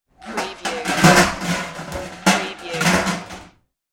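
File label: Kitchen Pots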